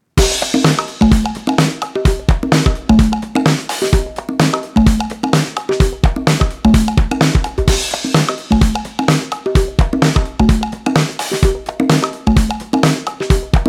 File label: cheerful sax drum comp; by burak sound bank; cheerful vol 18